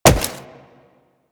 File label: Wall Jump Default; Footsteps; Warframe; Tenno; Wall Run